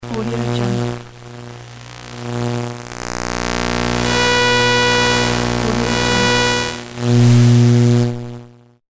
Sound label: interference